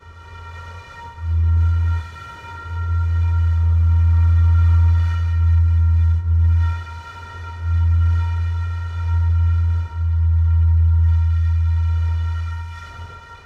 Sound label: Whistle Stone Champagne E Loop; Journey